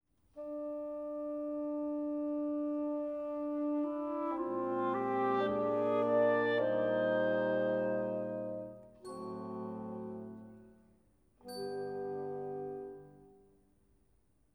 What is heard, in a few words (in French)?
instrumental